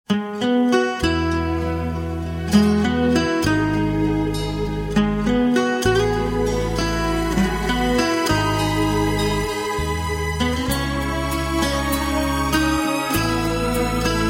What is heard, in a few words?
Magical Tune